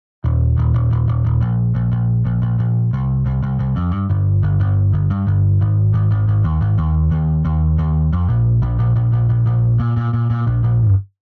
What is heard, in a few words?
Bass Off